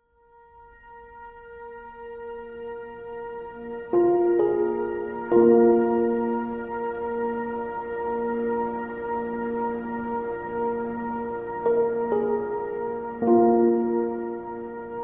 Sewer Orchestra; mr future ninja

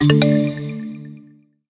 Quest Win; The Treasures of Montezuma 4

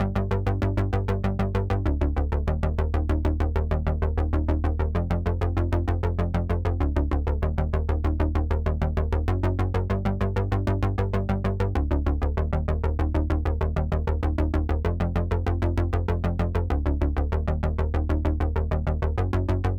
Bass line 8 Bars; vostok inc. switch gamerip 2017

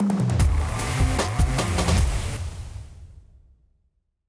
Jingle Mission Fail

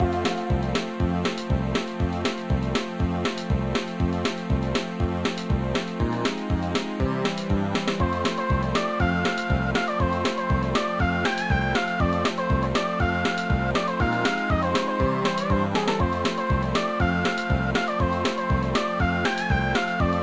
Bonus; Mr. Nutz; gameboy advance

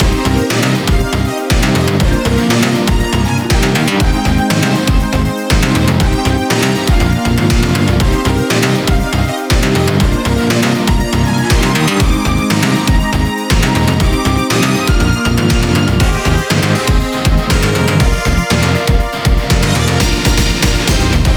Stage Loop; Break Circuit

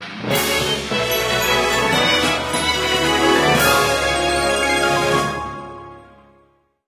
bgm you win

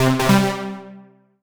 Solo Warm Synth Positive; MUSIC EFFECT; startide

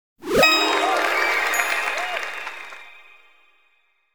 Win; Elsa

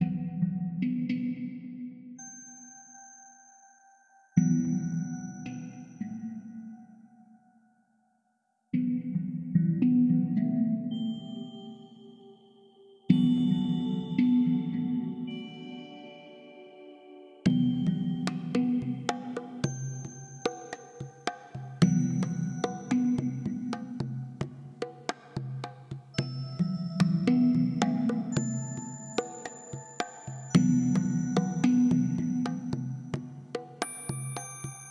Cave Intro; Shu; ps vita ps4 switch windows

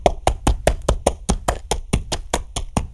donkey trot; Birthday Party Bash; Wii

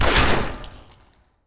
Gunshot

